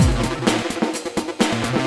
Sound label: BEAT